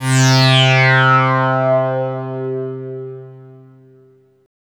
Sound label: NRG Pad